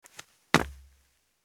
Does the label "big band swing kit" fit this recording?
No